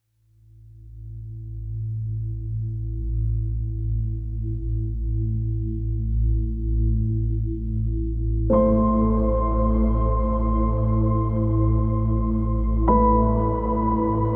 cosmic void drone

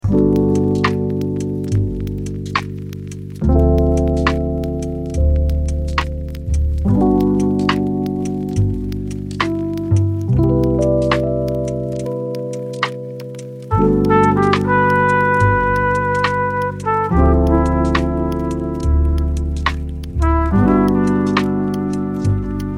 coffee shop jazz

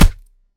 close solid punch

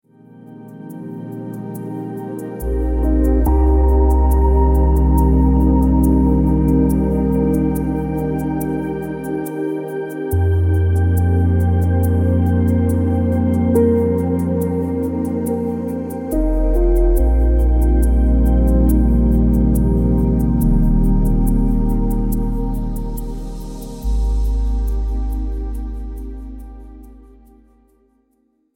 dreamy stargaze beat

